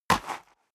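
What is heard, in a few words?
step boots gravel light single left, footsteps; schritte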